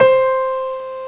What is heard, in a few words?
PIANO